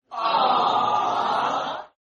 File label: reactions love